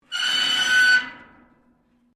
METAL RUSTY SCRAPE LONG; Materials

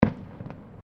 Fireworks Single Firecracker; Fireworks (Sound Effects:Backgrounds)

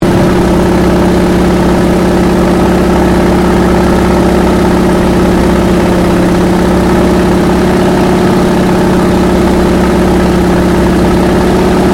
AIRCRAFT CAC WIRRAWAY FLYING INTERIOR STEREO; CA-16 Wirraway; Airplanes

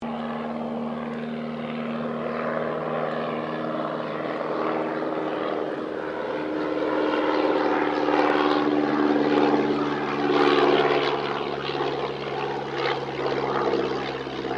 AIRCRAFT DH82A DEHAVILAND TIGERMOTH PASS; 1936 Tigermoth; Airplane Sound Effects; Vehicles

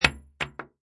Bullet Shell Drop Machine Gun Hollow Wood; Machine gun empty shells fall on hollow wood; Impact Sound Effects

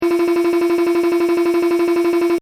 Beeps Data Transmission Technology; Beep Sound Effects; Scifi Science Fiction Multimedia; BBFX

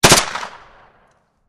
MP5 short burst, Submachine Gun MP5 Short Burst; VRSonic; Guns

